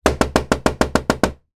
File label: door knocks small glass window on wooden door; household